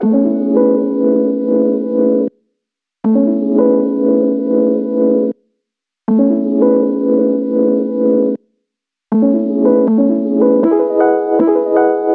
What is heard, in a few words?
Lofi Piano 158 A